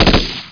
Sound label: m16 burst